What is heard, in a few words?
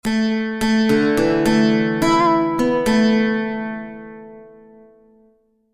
lute riff; Neverwinter Nights 2